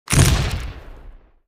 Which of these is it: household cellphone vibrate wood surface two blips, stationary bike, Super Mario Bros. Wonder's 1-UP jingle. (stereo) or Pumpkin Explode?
Pumpkin Explode